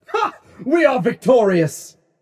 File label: yay; smite; voicepack; rama